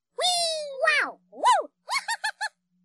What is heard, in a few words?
Whee